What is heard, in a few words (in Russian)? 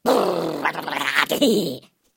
mult drojanie